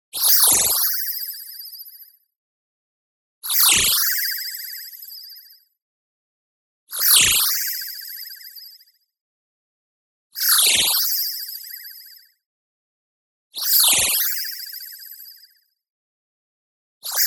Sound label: MOVEMENT FAST Grains Flutter Upfront; boom library; Anime Essentials; DSGNMisc